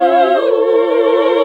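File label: Classical Sing, choir; Best Service Gigapack 1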